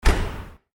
door; maoudamashii

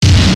battle; maoudamashii

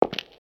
footstep; maoudamashii

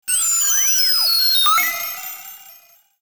magical; maoudamashii

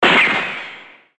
battle gun; maoudamashii

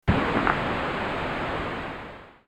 magical; maoudamashii

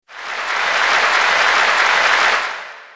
element water; maoudamashii

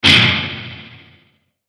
element fire; maoudamashii

